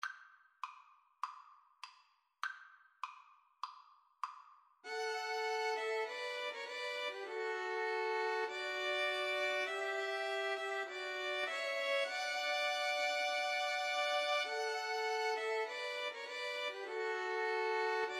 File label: 2 Violins Cello